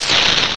scrub down, enemies; zelda

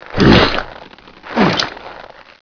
reload, hgrunt; svencoop; cracklife

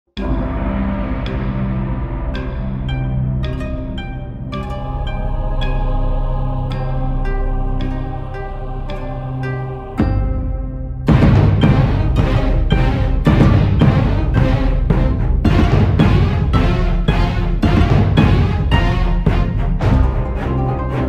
the swamp; svencoop; gruntsparty; cliffrunner

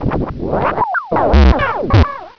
child die, gonarch; svencoop; cracklife

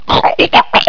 swim; svencoop; cracklife

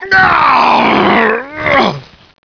die; svencoop; cracklife; hgrunt

